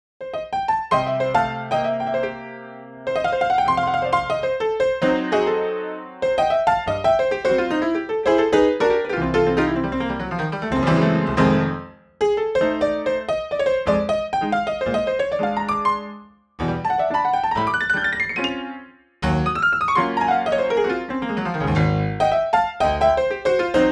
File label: blues, pianoteq model d; pianoteq7